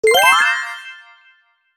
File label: Pop up reveal